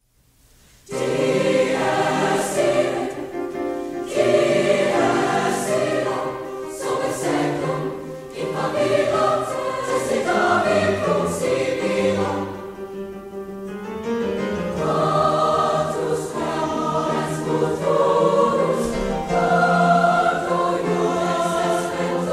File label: Dies Irae, Concert Choi; Wolfgang Amadeus Mozart